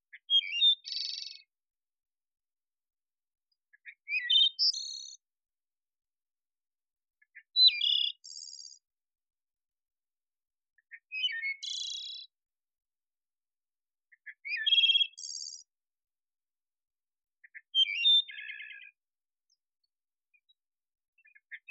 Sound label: Wood Thrush